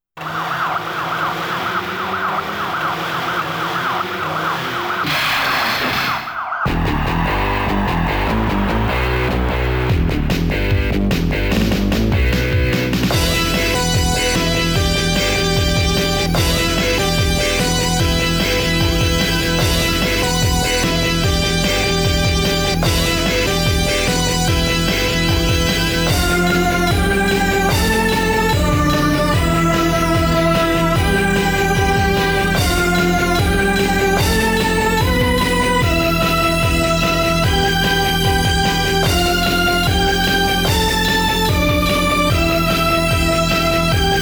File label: Guitar Rumba; Fuu Un Mokushiroku; Savage Reign; Gordon 2 Ending